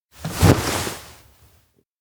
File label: hug cling; anemoi